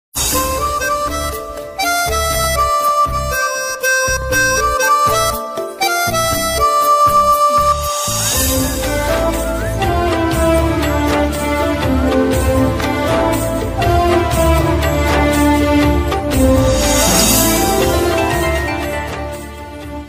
soft love ringtone instrumental ringtone movie ringtone flute ringtone; bindass movie